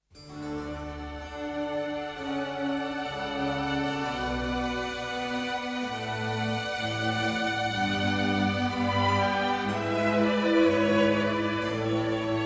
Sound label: orch